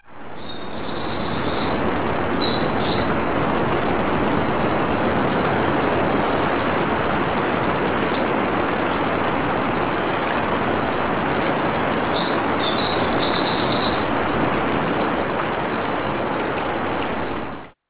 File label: bird; Sensorium; kawasaki